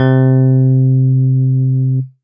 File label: Korg DS 8 Electric Piano C5